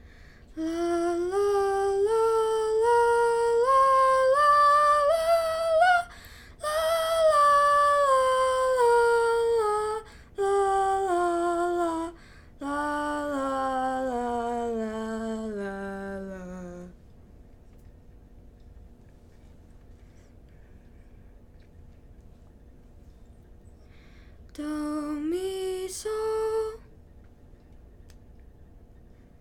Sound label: Alto; allstate2024 jrhigh; Audition